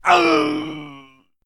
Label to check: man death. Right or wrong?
Right